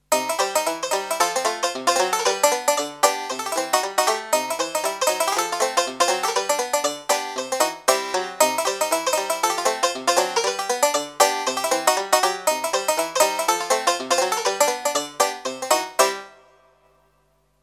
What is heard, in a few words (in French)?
banjo